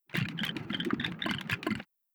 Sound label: arthopoid greetings; Stellaris